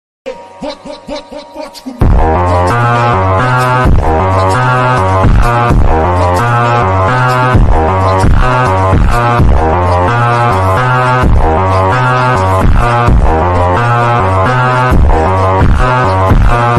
attitude ringtone song rowdy ringtone boss ringtone